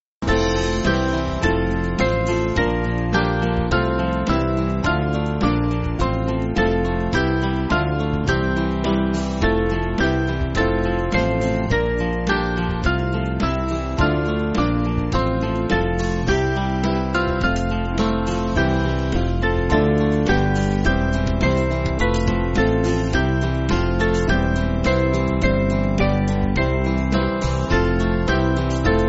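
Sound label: Band, Backing Files for Churches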